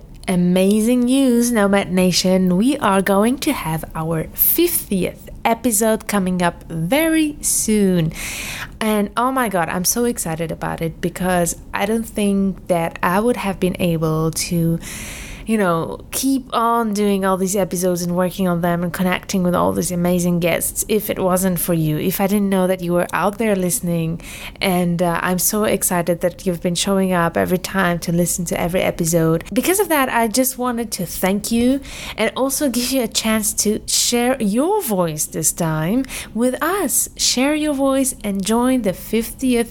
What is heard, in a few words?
Voice party